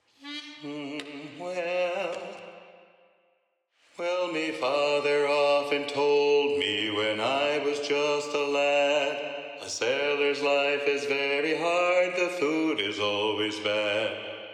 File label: Bass Predominant; sea shanties